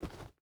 footstep rubber boots dirt walk; Rust

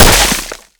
impact wood 20mm; weapons; Modern Warfare

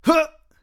hit; Escape from Tarkov; usec2

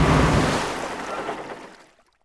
Transport Run End; Civ4 warlords

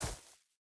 footstep burlap grass jump land; Rust

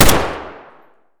m1a fire indoor close; Escape from Tarkov

